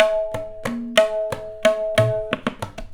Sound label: TABLA; USB Soundscan vol.45 - Indian Traditions; AKAI